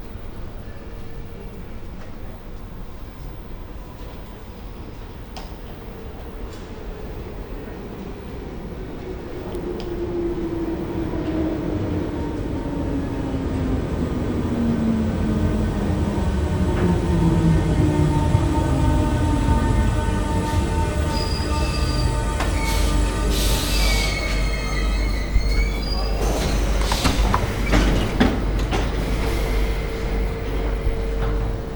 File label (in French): Rer Arrivee Ext, Paris metro; french